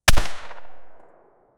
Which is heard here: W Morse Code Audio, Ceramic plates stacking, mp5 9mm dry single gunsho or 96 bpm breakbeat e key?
mp5 9mm dry single gunsho